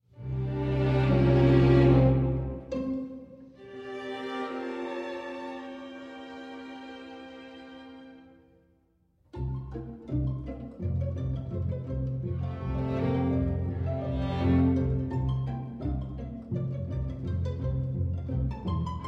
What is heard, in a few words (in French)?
pizzicato caprice pour instruments a cordes; pleasant memories gais souvenirs